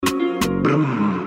brmm

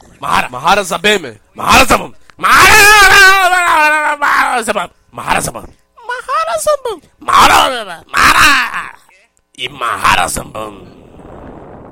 mahahahahaha

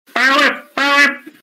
power whip

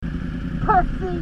cat

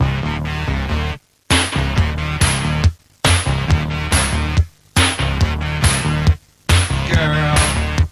intro music; omega tiger woods

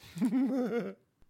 giggles

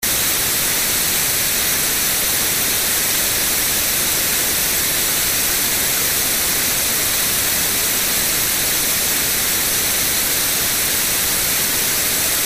static

